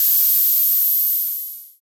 HH, HH Synth; Sound & Vision - Gigapack II; HiHat Synth

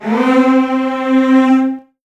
C3, Virtuoso Strings; ILIO; Kirk Hunter Virtuoso Strings VOL-2; 90 s Sample CDs

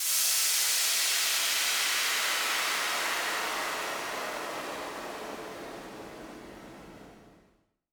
Downlifter; 99Sounds x Monosounds

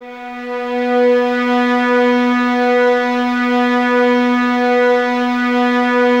violins B 3, string ensembles; Miroslav Vitous; 11 Violins

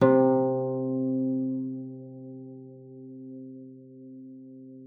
B2, harp forte; Xsample VOL-8

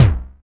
NOISE TOM F; TEK PERC2

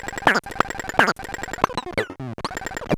Bent Talking Teacher, Circuit Bent

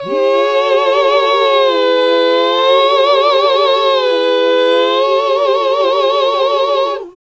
HAL GOSP., GOSP. MMMhh; Sound & Vision - Gigapack II CD 1 (Roland)

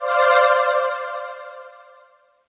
chord one shot C, Classic Chicago House; Sample Magic; Chord Shots

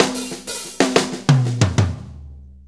6-8 Fill, Drum Tools

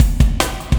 Warp Drive Beat, Processed Drumkits

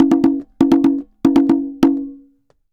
Congas Poly 120, Drums & Percussion; Best Service; Festa Latina VOL-1

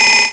telephone; ST-XX Amiga Soundtracker Sample Packs

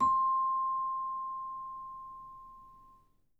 C6, Percussion & Harp; Miroslav Vitous

